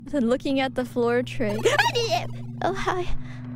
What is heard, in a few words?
jumpscare; IRyScream